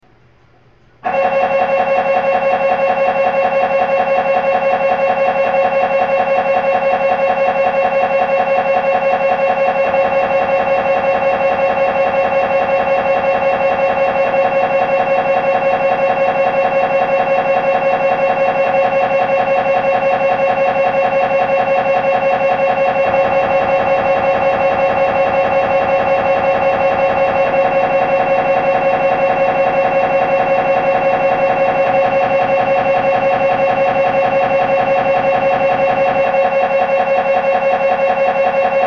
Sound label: MRI Sounds